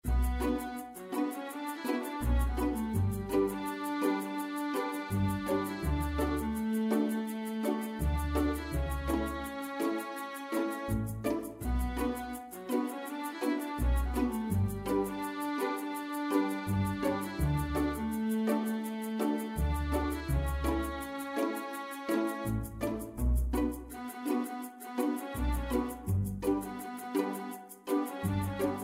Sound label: viola